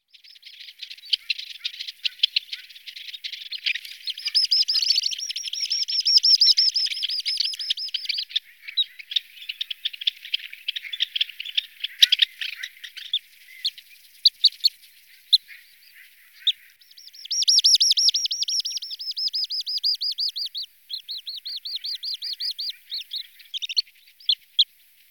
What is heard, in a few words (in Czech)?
Jespak maly Calidris minuta, nasiptaci